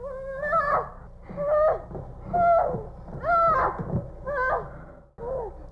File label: sex; Mafie 1